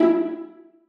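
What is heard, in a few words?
violas pizzicato d#4; Sonatina Symphonic Orchestra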